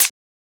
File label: Closed Hat; FLPRO